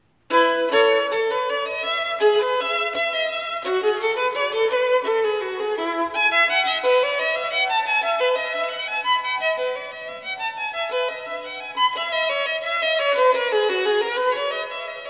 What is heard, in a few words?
Partita No.3 in E, Bouree, violin; BWV 1006; Bach